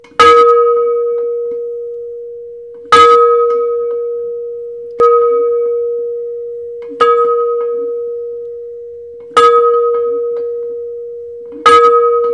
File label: bell